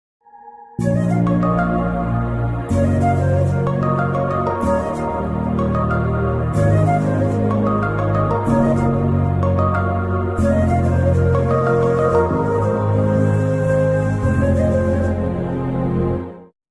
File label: flute bgm